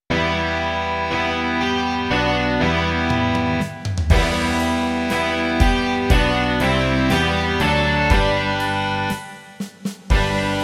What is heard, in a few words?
rock